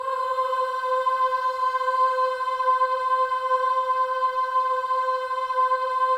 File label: BOYS AHS, Symphony of Voices